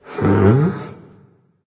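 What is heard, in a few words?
non verbal thinking, voice; cernunnos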